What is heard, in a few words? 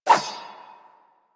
Rapier Whoosh; Swords